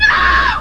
FLAME; Marathon revisited; BOOBFLAME

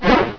Yari; NinjaBoy

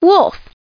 WOLF

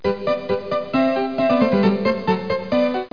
BFLY TUNE; Education Publishing Concepts; NOAHDEMO

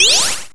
power

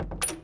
dor; Szkola Koziolka; CyberMycha 2003 October

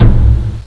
big drum, percussion instruments; Sound Effects for Multimedia - Volume 1

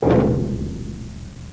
bass drum; Sound Effects for Multimedia - Volume 1; percussion instruments

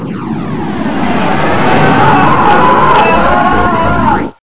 jet crash

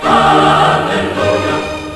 Finish level; Maniac lite v2.1.1

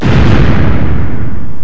cannons

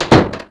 door; The Sum of All Fears

